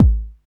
low bass drum single shot A key, kick drum single hit tuned to the A note; kick drum samples